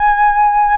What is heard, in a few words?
flute; Winds